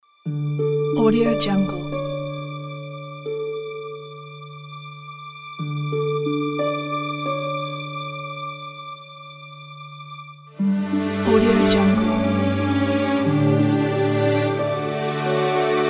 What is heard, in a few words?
nature documentary opening